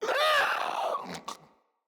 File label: Infested Runner Hear Sound